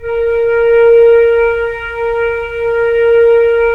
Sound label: FLT FL, Flute; Roland - LA Composer vol. 4